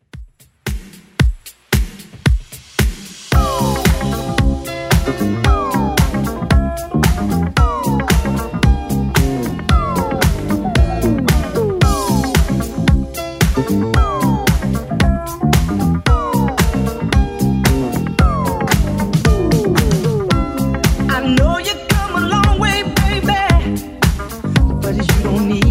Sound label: 70s Dance Redrum 113bpm